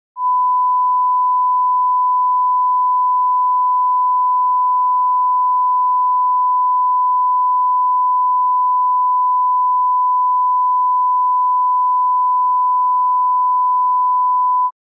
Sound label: 1 KHz; MP3 Forge; L Ch